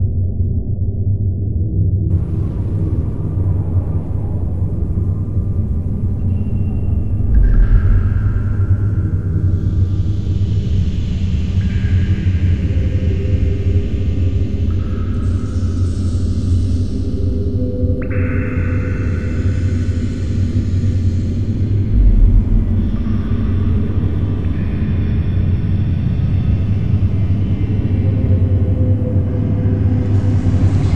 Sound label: Cave Loop